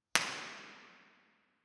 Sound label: Impulse Response; STI